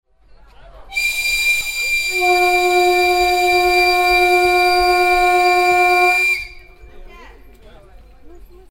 Baikalbahn Horn

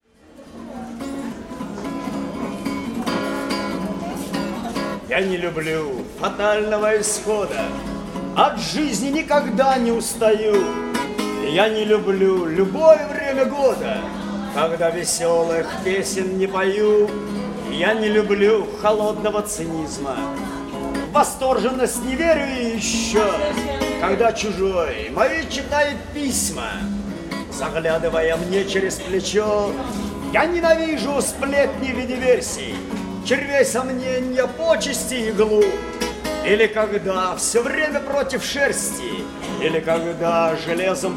Duschanbe Saenger; zentralasien

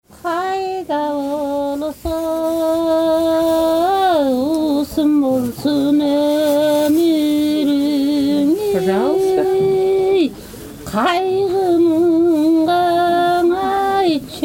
Gesang, Tamchy; kirgistan

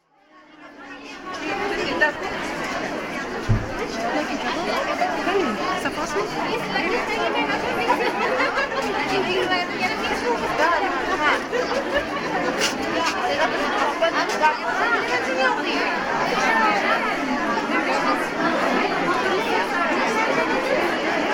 Goldbazar; Bukhara

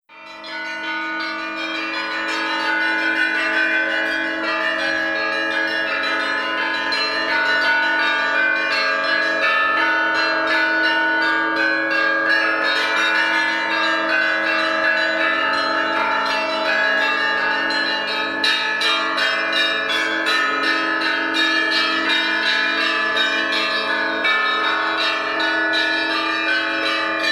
Kirchenglocken Karakol; kirgistan

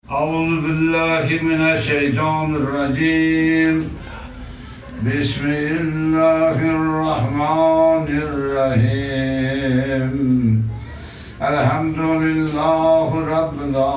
Gebet, Osh; kirgistan

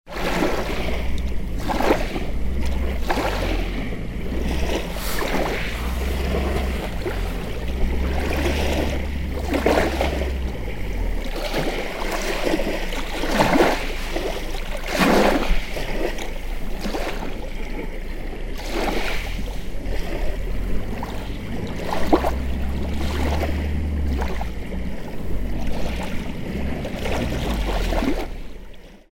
Baikalsee

